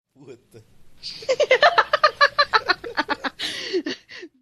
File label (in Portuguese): risada